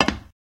glass step; Golden Crayon Sounds

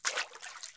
spring step; Original TK Sounds

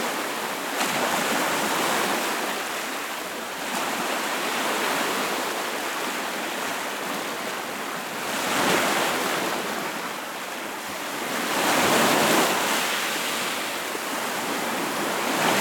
waves; Golden Crayon Sounds

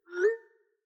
Messages Outgoing Haptic; iOS 26 Sounds

